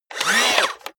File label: robot movement fast